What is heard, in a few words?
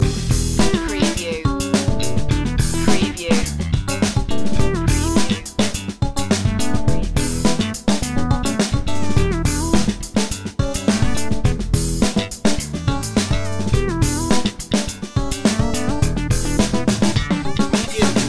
do it funky loop